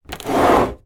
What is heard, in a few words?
make up box metal slide on wooden surface; household